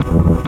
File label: E stab; Loopmasters; Funky Underground Vol 2